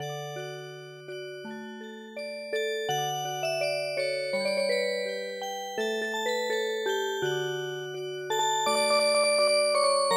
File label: Music Box, midi